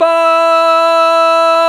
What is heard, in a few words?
VOX, Male Scats